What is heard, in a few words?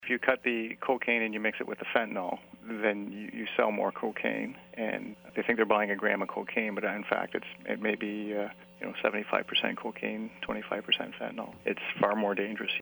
synth